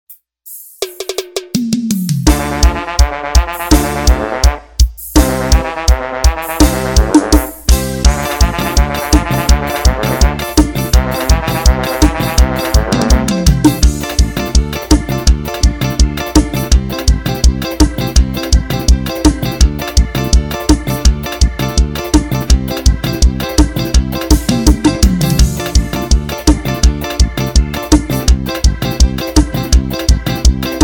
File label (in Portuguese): Eletrico Playback Karaoke; DAMIAO SAMPLES